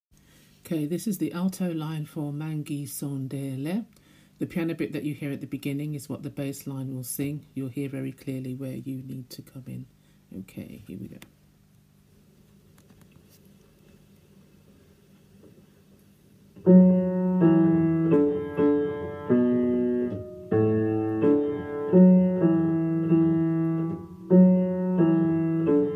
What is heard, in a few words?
ALTOS, gospel choir